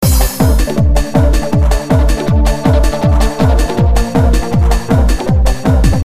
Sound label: loop